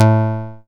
DIGITAL BASS, BassGuitar

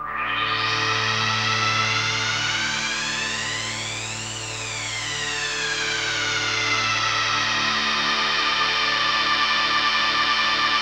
RESO WASH, Synth FX Misc

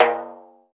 Doff Rim, Roland BK-5 Percussion

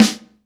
SD BONUS, Drums

